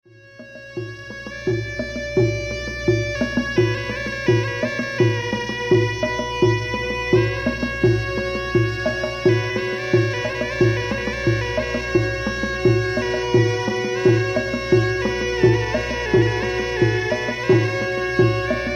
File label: Snake charmer